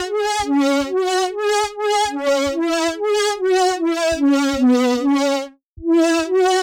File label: 145 A Melodies; Touch Loops; DigitalSpectrumsMini